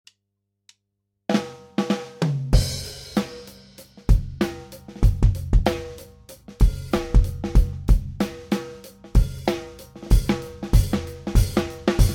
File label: Demodrums; Calf